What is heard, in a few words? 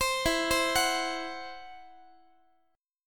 E Suspended 2nd Sharp 5th E, down, Guitar; Esus2#5 arpeggiated